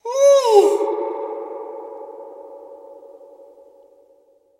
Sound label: Ghost Cry Supernatural, Long Tail